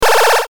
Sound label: powerdown; sfxr